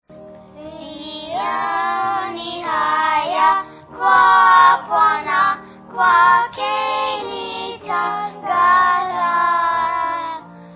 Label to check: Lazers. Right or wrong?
Wrong